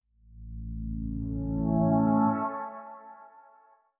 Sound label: gentle rise, logo; ふわっと出現; TinyBGM